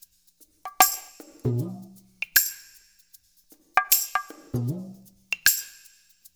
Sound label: percussion loop; InVision Interactive; Percussion Slam; MACHINE